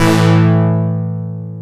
SYN ANA HIT, Dance Synth; Sound & Vision - Gigapack I CD 2 (Roland)

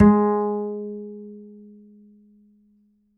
Upright Acoustic Bass

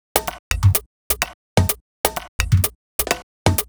Tribe Top 127bpm, House Drumloops; 90 s Sample CDs; Zero-G House Fabrik Drumloops; ZG Drumloops

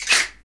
mouth, mouth klacks; Roland LCDP14 Africa VOL-2; Foot & Mouth

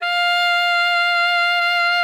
F5, alto sax; Xsample VOL-2

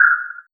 Vinyl Snare

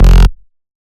Bass F, Bass Synth

